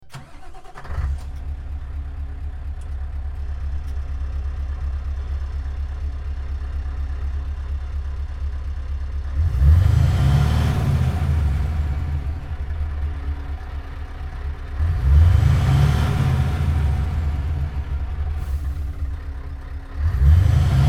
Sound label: Scania V8